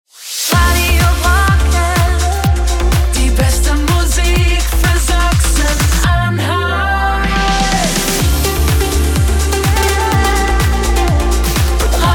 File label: Radio Jingles